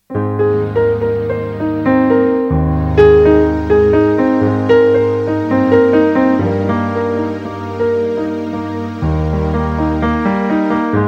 piano nights ringtone

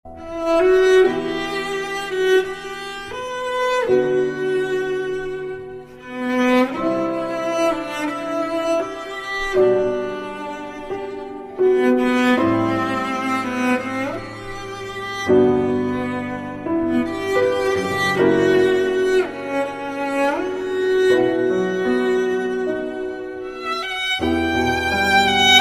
sad love violin and piano ringtone

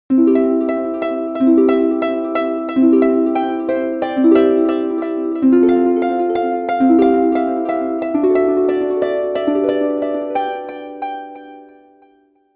jingle bells harp

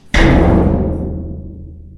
metal door message tone

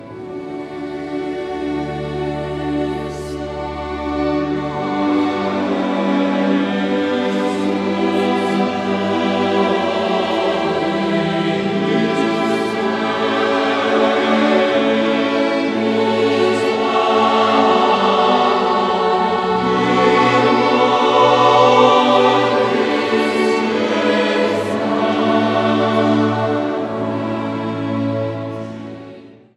ave verum corpus choir and orchestra

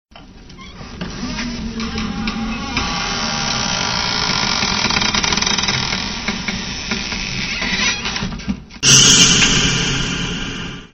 creepy text tone

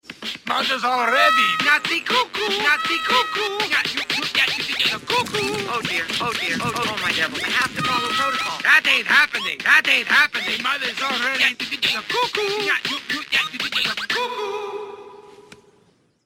nutsy cuckoo

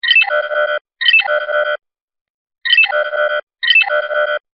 error; star trek

